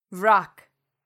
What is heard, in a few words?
vrock; bestiary; 5etools